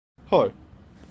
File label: idle, villager; minecraft; mob